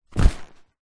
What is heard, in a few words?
foley leather handbag or pouch set down carpet